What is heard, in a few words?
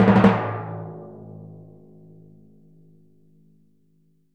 TMP A#, Synclavier Percussion